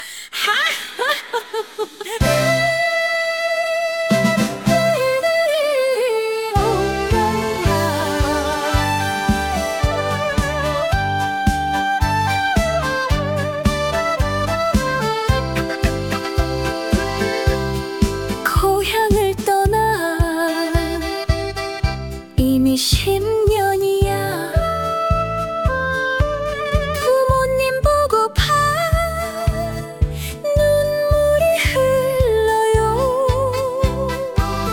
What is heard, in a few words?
trot; acestep